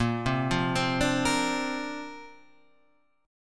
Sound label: D-Minor 7th Sharp 5th Bb, chord